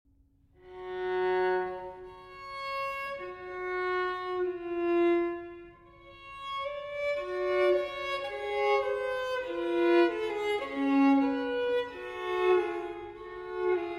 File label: Solo Violin in B-Flat Major Grave; No. 1; TWV 4014; Telemann Fantasia